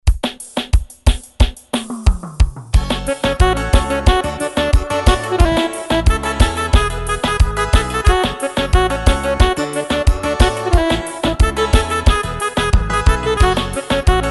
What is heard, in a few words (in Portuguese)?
ritmos yamaha forró